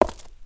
Footstep Stone Outside; creatures pets

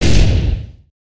Finish Line Bounce

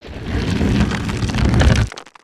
Pillar Crumble

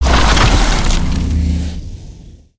Ice Tornado Start; Spinjitsu

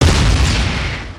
Flare Impact

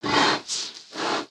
Pets Tortoise Idle; creatures; Lego

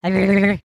Stunned; creatures organic; Lego; Strombie

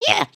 headbang

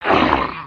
Thwok grunt; Summoner

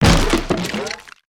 instrument smash; destructibles

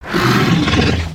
Tentacle Monster Growl; destructibles

